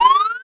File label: interface button, clicks